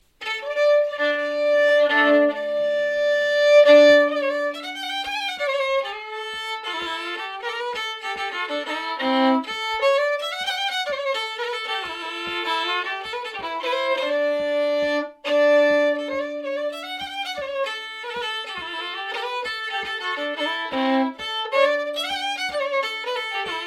polska; Hambraeus

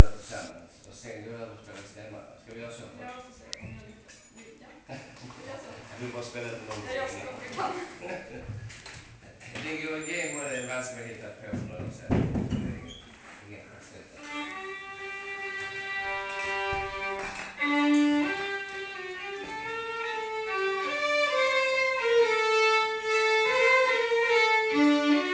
Smygevalsen

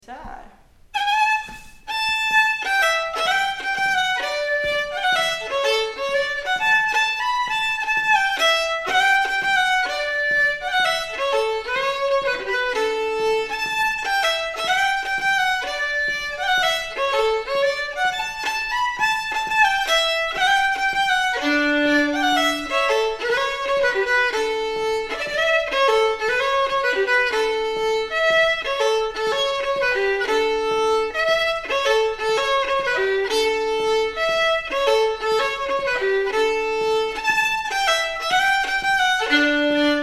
polska

